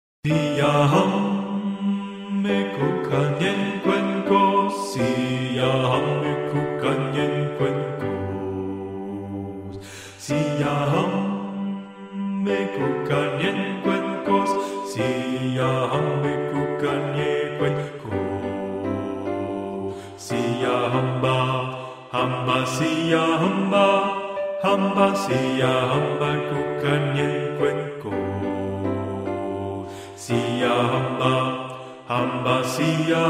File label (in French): Basse